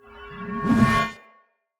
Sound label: Great Sword Accent Heavy; Weapons; Swords; Dendrit Ara Great Sword; Accents